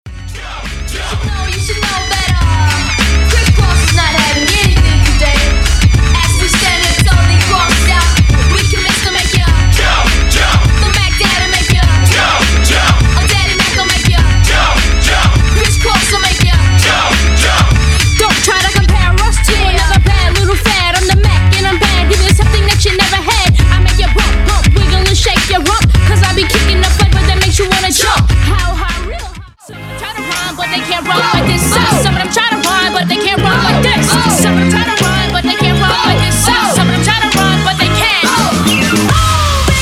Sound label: Twerk Segway 102 BPM; Twerk Edit